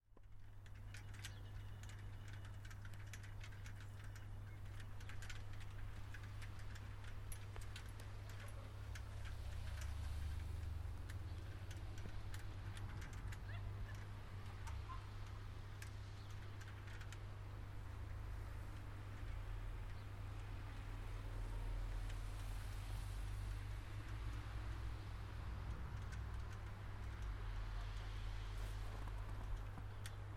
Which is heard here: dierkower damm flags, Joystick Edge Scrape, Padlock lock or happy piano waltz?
dierkower damm flags